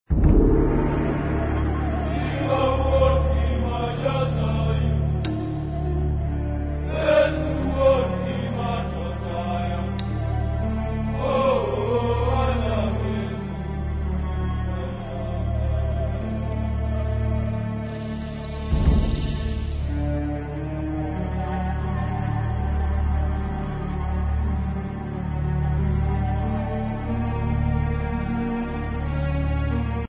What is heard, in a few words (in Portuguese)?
vozes d africa